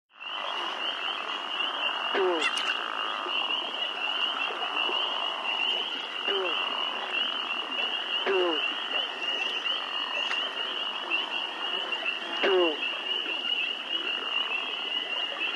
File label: BG Swamp Bullfrog